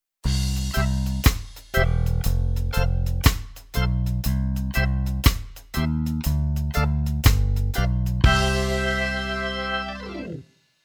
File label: keyboard organ reggae